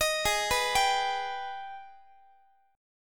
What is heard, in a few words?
G sharp Minor Major 7th G sharp down Guitar; arpeggiated; JGuitar